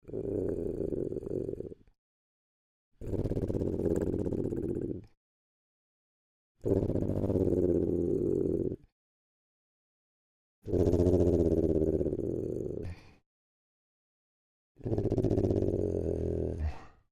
Creature Oodle Bird Growl Low; Birds (Sound Effects:Animals); Big Room Sound

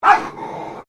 Animal Dog Chihuahua Bark Single Snarl; Newcastle; Various domestic dogs; Dog Sound Effects; Dogs (Sound Effects:Animals); Foundation1

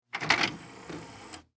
Technology Dvd Player Tray Open; Entertainment System

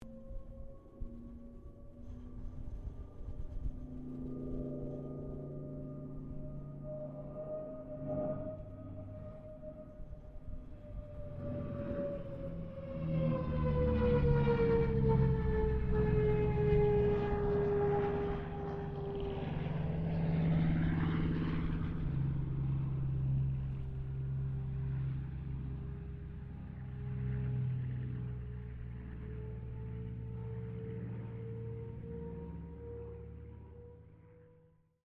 AIRCRAFT CA-13 BOOMERANG PASS DISTANT; Airplanes

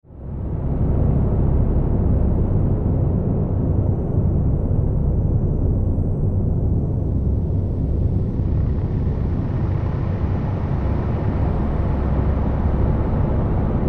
Sci Fi Spaceship; Spaceship Engine Room Drone Hum Buzz; Spacecraft

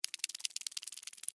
Insect Run Wet, Slimy, Bug; Animal

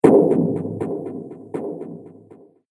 Impact Hard Heavy Knocking Echoes; Impact / Explosion Sound Effect

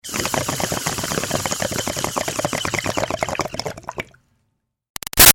Bong Water Pin In Inhale Bubbles Med; Nature

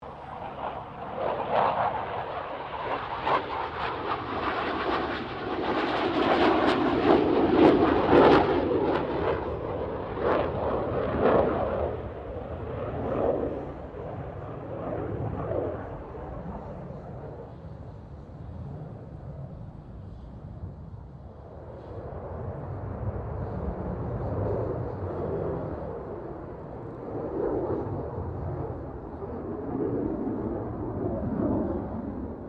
AIRCRAFT FA-18 HORNET PASS; RAAF FA-18 Hornet; Airplanes

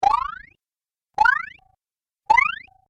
Cartoon Hit And Jump Sounds; Cartoon Sound Design Sound Effects

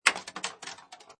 Pinball Sound Clank With Ball Travel; Video Games

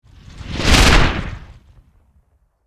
Hit Reverse, Suction, Punch; Production Element, Imaging Element, Accent, Transition; BlastWave

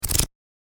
Book Flicking Pages; Book Sound Effects

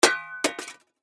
Impact Shovel Hit Bounce Off; Blastwave

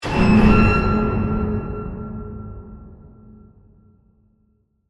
Hit To Metal Scrape; Production Element, Imaging Element, Accent, Transition; BlastWave

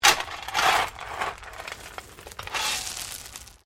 Digging Shovel Pebbles; Various digging tools and processes; Construction Sound Effects

